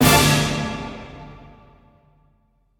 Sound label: battle end